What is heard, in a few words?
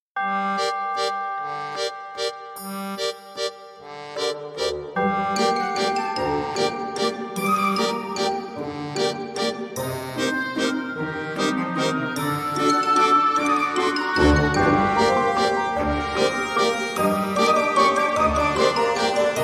Waltz